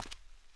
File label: step; WalkFX